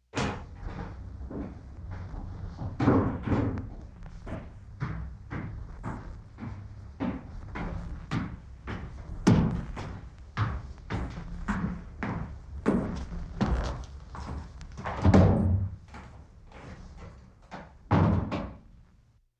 Walking Downstairs, Door Opens Closes

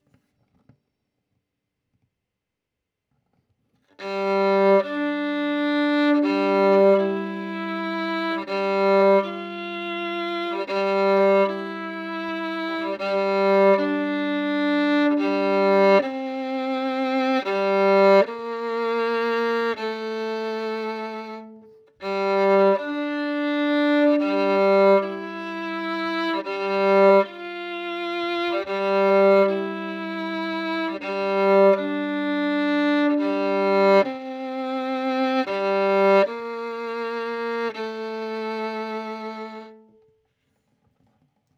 Violin; Verdigris Music

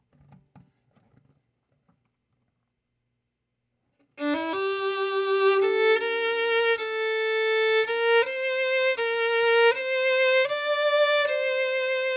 Violin; Verdigris Music

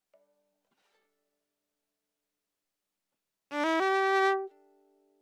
Violin

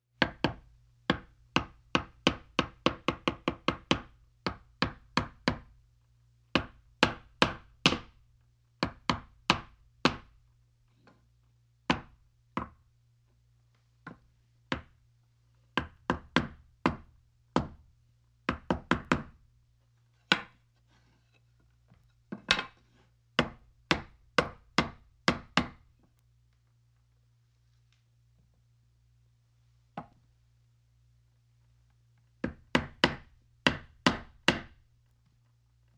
Hammering; Stereofect

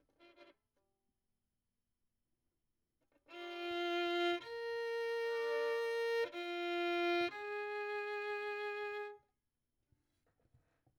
Violin; Verdigris Music

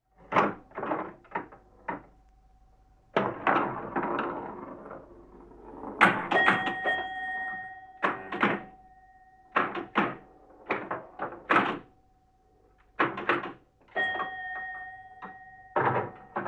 Pinball Machine

